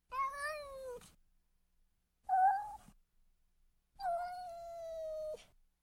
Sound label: Cat meowing softly